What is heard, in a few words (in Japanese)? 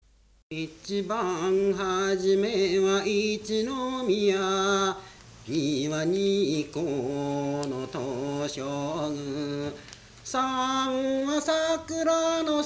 temariuta, minyou